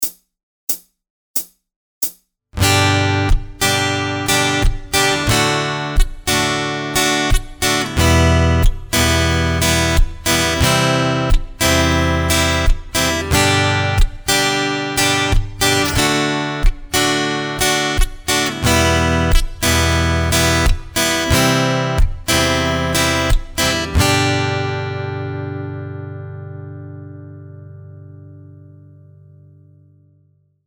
A Stylish Sounding Percussive Backbeat SLOW, guitar; Ultimate guide to strumming book